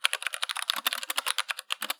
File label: keyboard typing